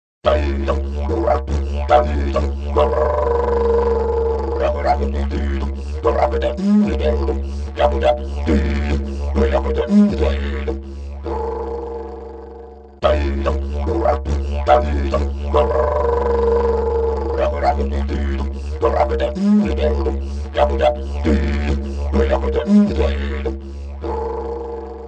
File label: didjeridoo